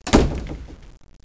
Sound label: Wood Door Slams Shut; How Many Bugs in a Box